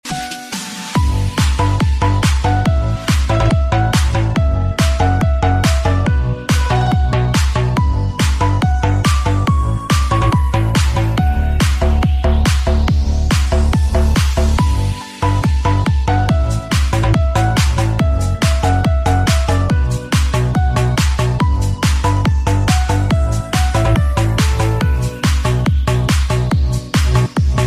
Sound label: Piano Remix; Nhac Chuong